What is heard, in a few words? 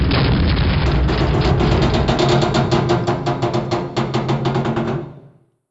mortar metal creak